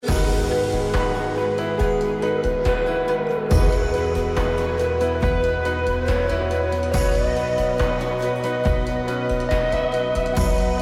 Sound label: rock